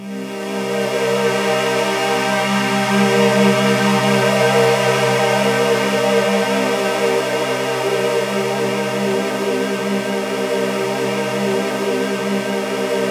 sweep, ambient atmospheres

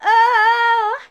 Female vocals and robo-vox

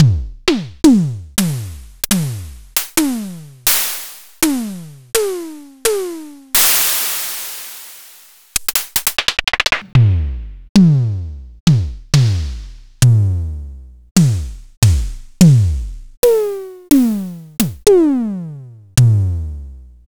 Ketron SD-5

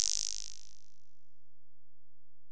Ride, Alesis DM-PRO

